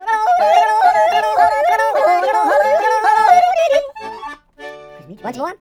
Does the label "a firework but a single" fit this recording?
No